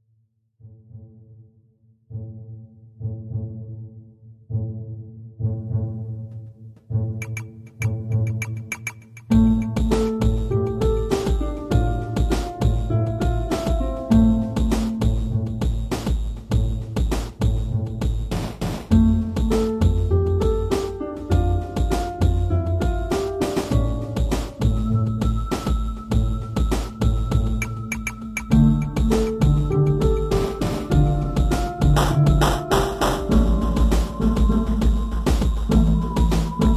Harp Funk; mobile; Cryo Frost; Junk Jack

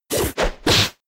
Knock

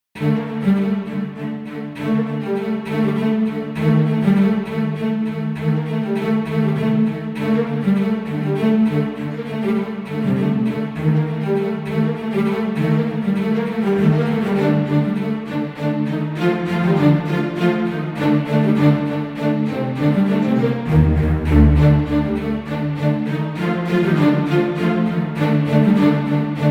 Sewers Combat; Novigrad

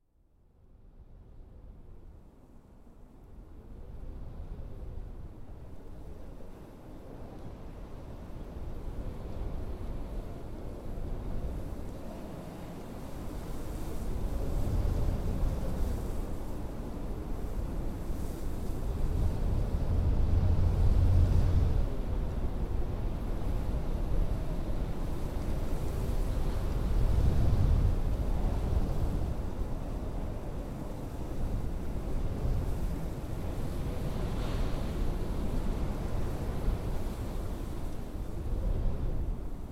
Snow Ambient; This Is the Police 2

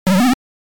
Rock Dropped on the Floor; Dig Dug; arcade

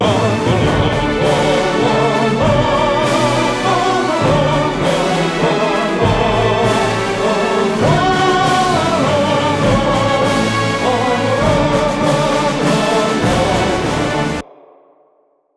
Classical Anthem

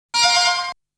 Item Acquisition Sound; Saint Dragon

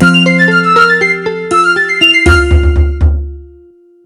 Fishing Level Up; RuneScape 3

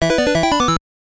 Entering a Door; Wizards & Warriors

